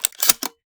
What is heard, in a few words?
shell insert, 870; weapons; Urban-Coalition; Decay; arccw ud